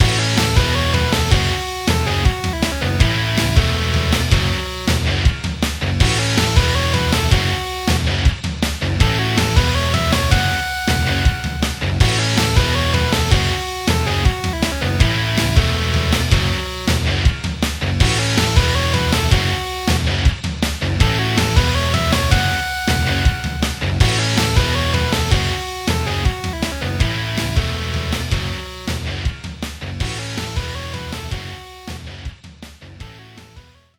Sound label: 3d Music